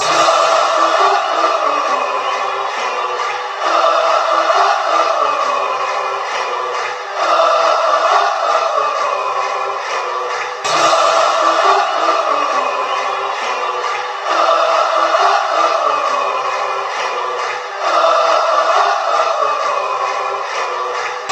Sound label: goal fanchants; bayern munchen